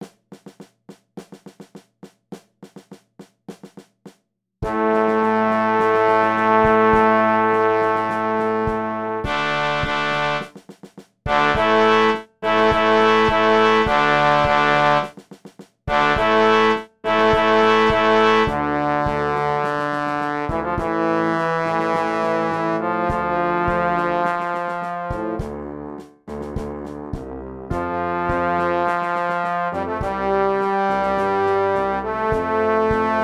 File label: March Brass; C March No.1